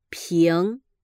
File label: ping